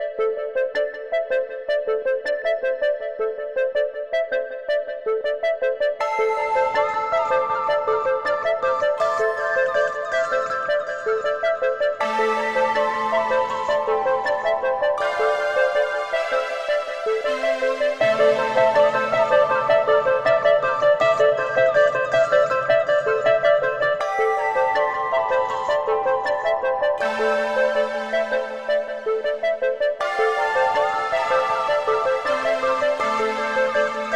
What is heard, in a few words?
AMBIENT